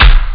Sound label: bas drum; Amiga Soundtracker Sample Packs